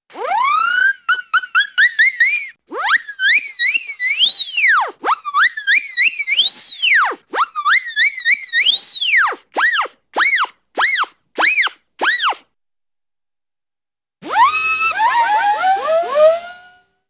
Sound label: Rapid Twirls (whiz Whistle) Cyclone Whistles, Cartoon; Hollywood Edge; Cartoon Trax